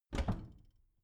bathroom cabinet doors open heavy; objekte; tueren

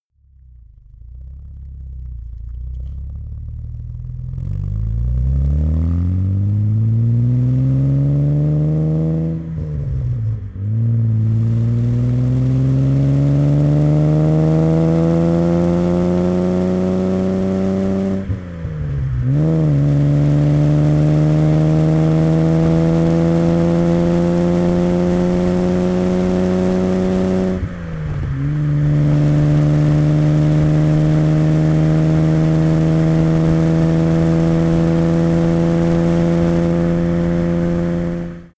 car jaguar e-type accelerate long exhaust

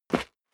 step sneaker concrete grit single stair up; schritte

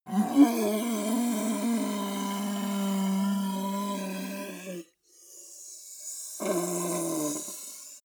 yeti groan long dying; kreaturen

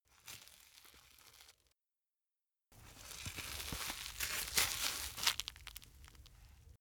boxing gloves close velcro long; sport freizeit; boxen

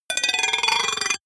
rattle metal can hollow; bewegung

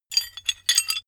metal handling pieces

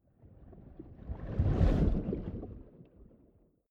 whoosh abstract soft

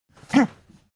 pug gag breathe short; natur-tiere; hunde

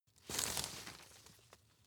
grab rustle short medium, forest ranger; berufe; foerster

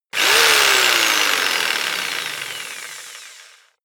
jigsaw engine fast short; maschinen; saege; soundpool

